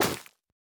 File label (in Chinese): 下界疣破坏声